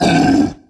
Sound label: half ogre male hit; DAoC